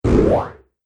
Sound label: Boing Extra Big Arcade Jump; Boing Sound Effects